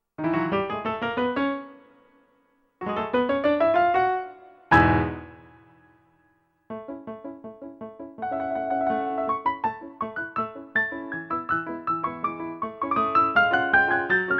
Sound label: debut tenors